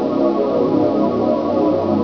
tractor beam loop

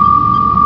hail incoming; xshipwars

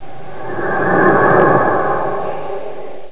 cloak up; xshipwars

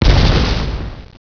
collide, explosions; xshipwars

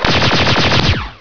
fire pulse

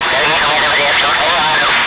transmission; xshipwars

